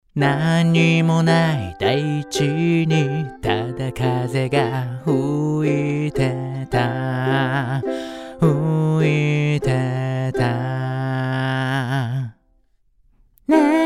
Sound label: Vocal, LA610A, U87Ai; Level3